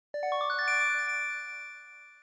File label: archivement sound; stardew valley